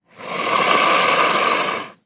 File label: Puma Cougar Hiss Long, big cats cougars